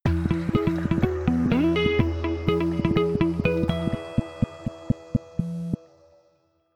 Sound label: Stereo Electronic, ringtones; Motorola Edge 70 Max China; Lenovo